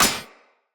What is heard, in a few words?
Short Sword Medium Impact Armour